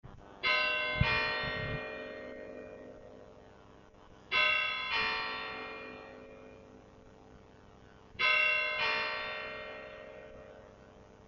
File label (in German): glocken